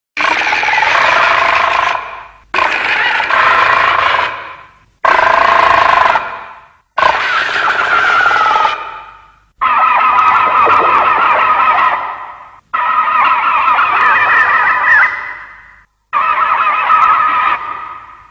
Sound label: roar; Hedorah